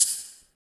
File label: RSS PERC